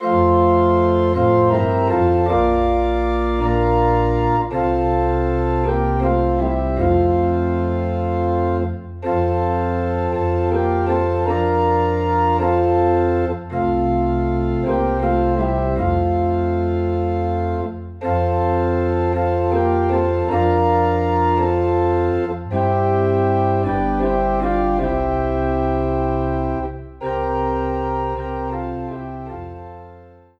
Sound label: Organ in F; Take Time to be Holy